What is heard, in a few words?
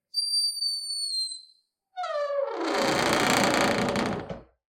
basement door squeaks; ucns; Sonniss GDC